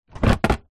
vehicles scooter moped seat drop down

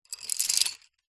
office pencils handful pick up from concrete

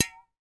household deodorant cans x2 hit; sound effects one

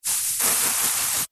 household aerosol can spray; zapsplat

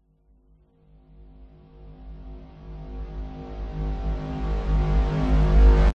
riser electronic buzzing humming synth drama fear; sound design

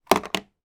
technology computer mouse put down on desk

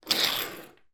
household key car electronic slide across table

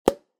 household cork pop champagne outside; zapsplat

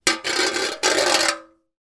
household grater metal knife scrape on grid; zapsplat

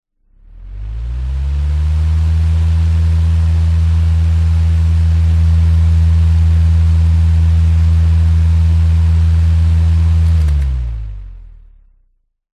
household fan operate run short close; zapsplat

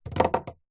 household hairbrush set down wood floor

